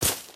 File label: bush, human step